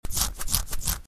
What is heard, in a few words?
Scratch; Lazy10 Scratch